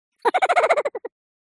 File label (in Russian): smeh multyashnyi